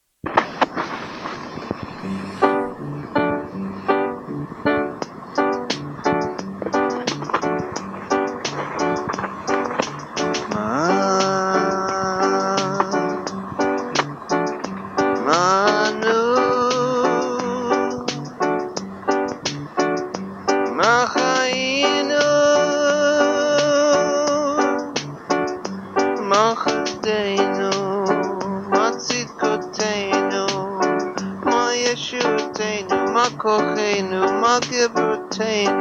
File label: noisegate